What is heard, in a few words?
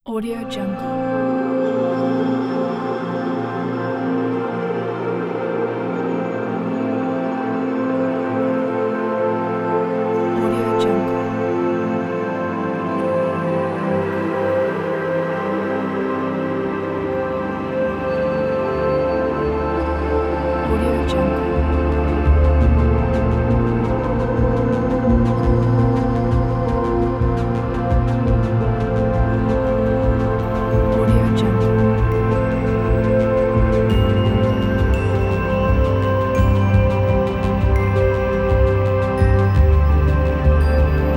relaxing space ambient